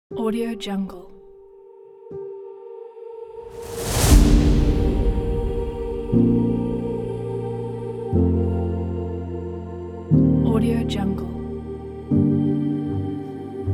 construction cinematic orchestra

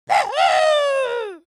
creature dwarf cheer wuhu

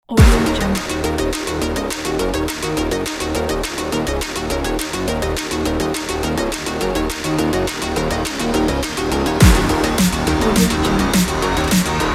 run aggressive sport electro; cell3xo

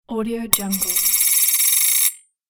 the spin coin

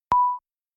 beep sound

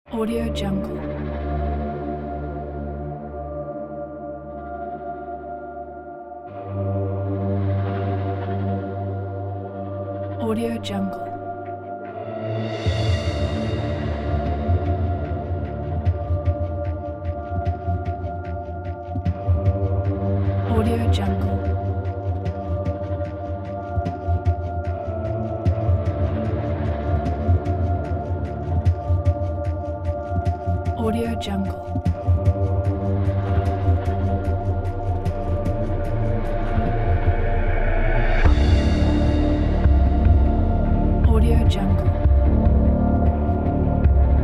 growing tension cinematic

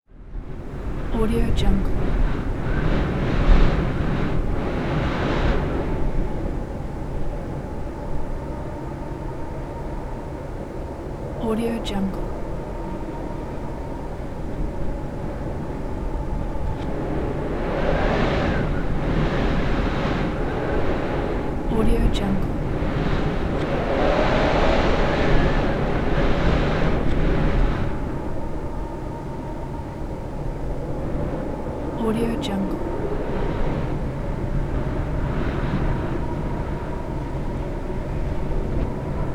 wind

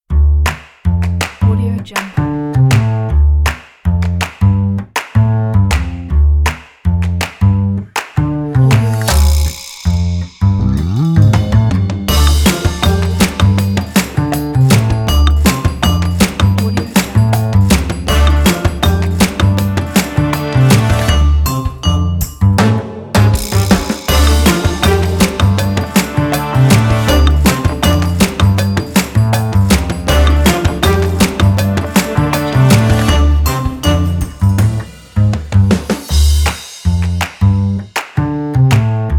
quirky retro

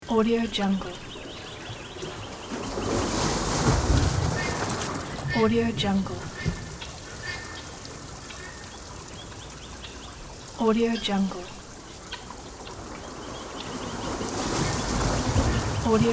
calm nature sounds

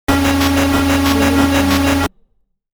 wacky electronic motor run loop; hollywoodedge

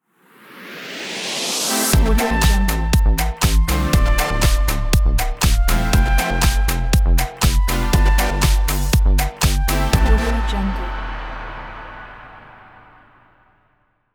short logo

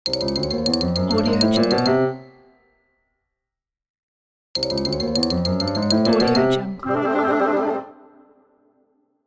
cartoon piano suspense run up

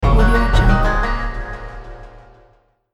asian way

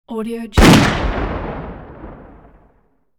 rifle shot with ring off; hollywoodedge

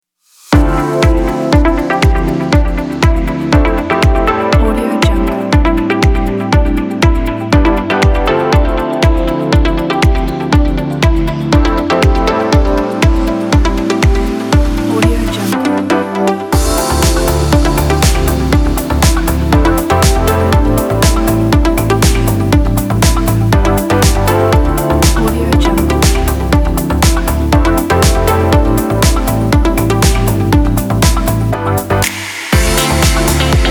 the upbeat fashion music kit

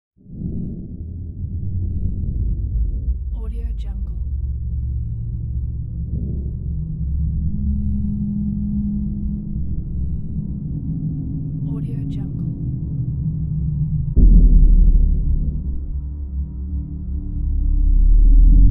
cinematic ambient background

